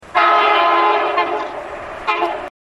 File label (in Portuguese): Buzina; locs elec; Comboios